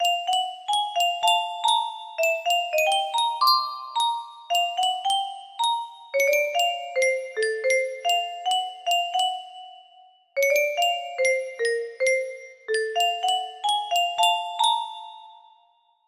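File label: music box melody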